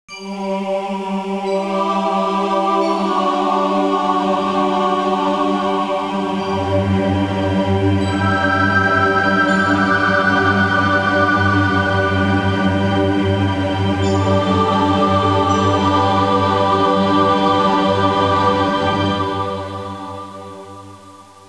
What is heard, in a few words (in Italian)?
musica newage